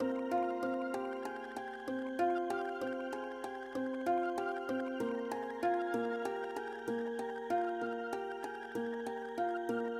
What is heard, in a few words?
MELODICS, viral hiphop